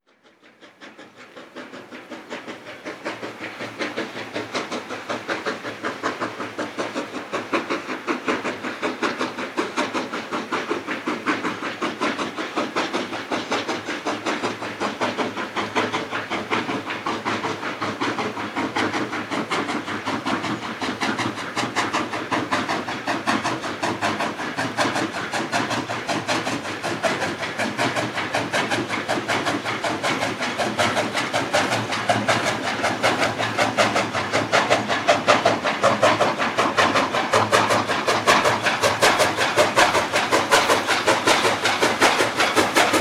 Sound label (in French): locomotives a vapeur; sonotheque